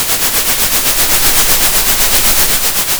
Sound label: pop, Sound of a train